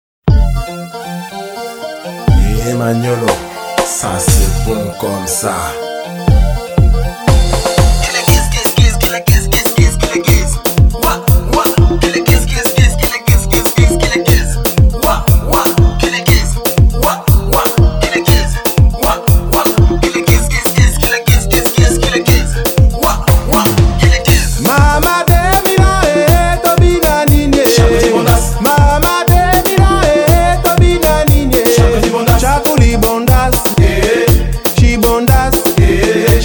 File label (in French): Ambiance Tropicale-Musique Africaine